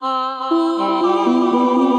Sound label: Hocketing Voice, Transformed Voices; Voice Box; Chromatic Voices